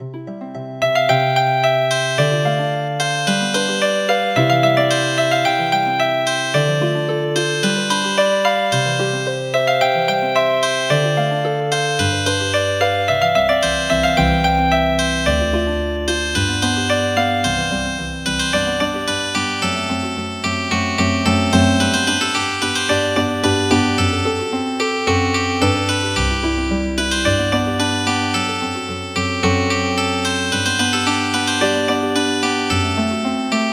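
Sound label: Healing Harp, traditional; El Xarranco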